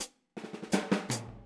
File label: Boogaloo Hi Hat, 82 BPM; Sonic Foundry (Sony Creative Software); Drums from the Big Room The Mixes